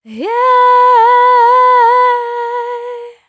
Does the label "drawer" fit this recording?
No